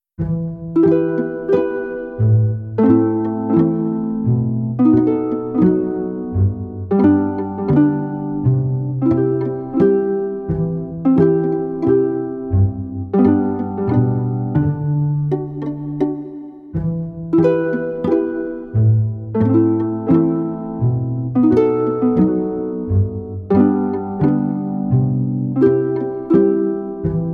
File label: Theme in G